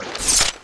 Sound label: burst discharge draw, weapons